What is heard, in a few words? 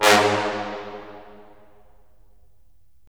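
brass; LA Composer vol. 3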